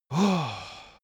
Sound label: cartoon male relief close call